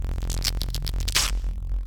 Shock Three

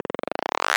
Increase

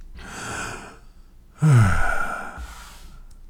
Deep sigh

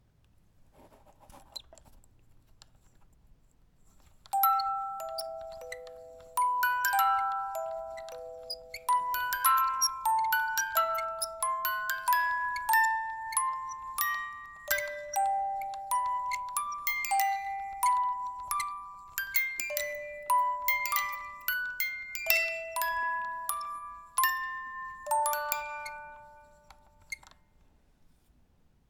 Music Box

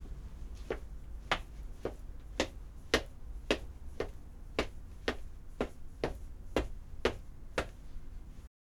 Climbing Stairs High Heels

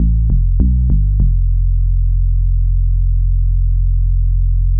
A2 Bass

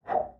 swoosh

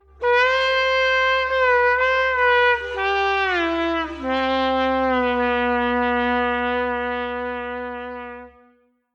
A Sinful City

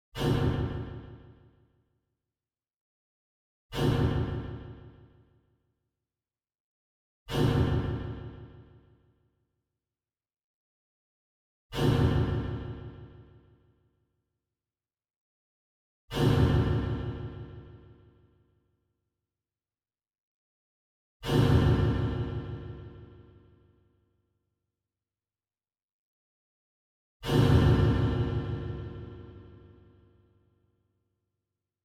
Metal Impact Atmos

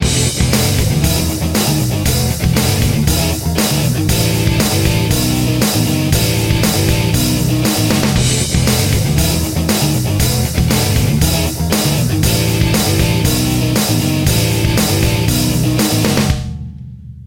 Guitar Riff; Boss

